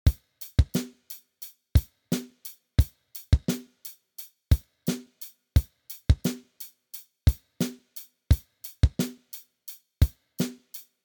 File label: groove drum